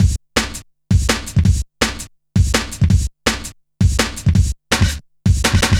Drum Loops, Jungle

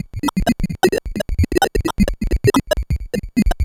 Sh101 Loop, 130BPM

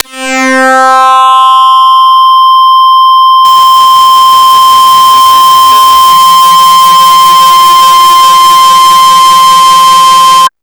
DIST STACK; Big Beat Samples & More; AKAI

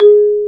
Bass Marimba

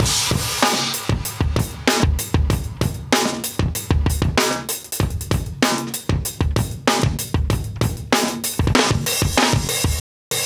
96 Rock Tail Funk, breaks, Live Breaks

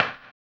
HAMBONE, Vocal Blues Soul; Spectrasonics; Planet

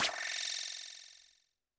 percussion, Roland TD-20

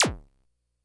Bleep A, Drum Machines, Korg DS-10

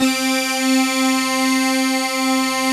basses; Wizoo - Powered Wave

